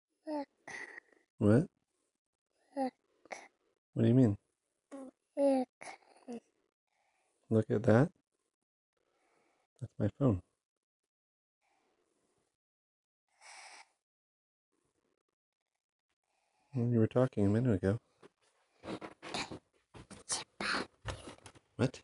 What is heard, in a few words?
waking up from a long nap